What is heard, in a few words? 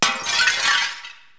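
large shatter, destruct; Wired for Sound Pro CD; Aristosoft, Inc.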